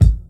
old school strong bottom end funk kickdrum a key

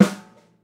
small reverb acoustic snare sample g key

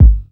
dry kickdrum g sharp key

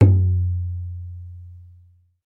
low tom drum f key; tom drum samples; one shots

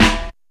steel snare drum sound D key

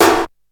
old school ambient steel snare drum f sharp key

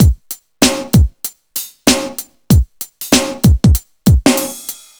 96 bpm drum loop sample c sharp key

